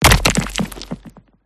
rocks impact hit big lfe heavy designed; PMSFX

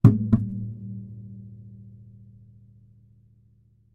musical guitar acoustic drop on floor

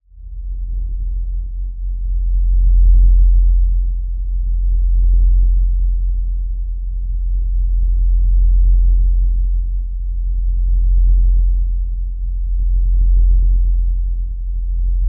Surge Test Run; martyn stonehouse

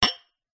pub wine glasses cheers toast; field reports

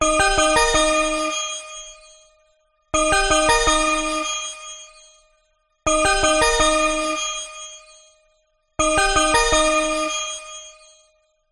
multimedia voip call ring software; zapsplat

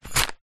tent pegs in pouch movement; foley

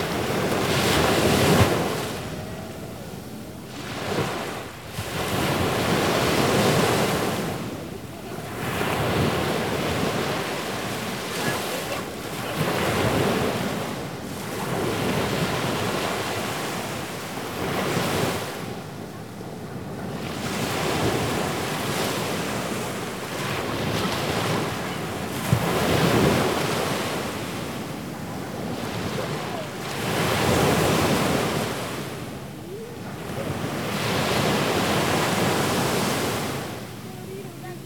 Sea, Waves, Child

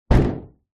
impacts plastic hollow unit impact drop

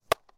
sport baseball catch glove; martinimeniscus

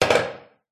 pub wooden bar stool set down on tile floor; field reports

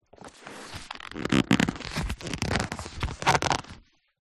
household book telephone directory handle squeak

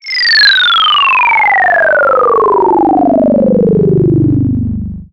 sound design stutter synth descent

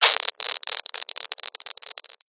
science fiction radio signal interference burst

